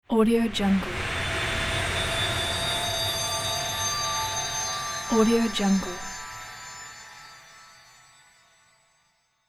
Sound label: spell of magic transition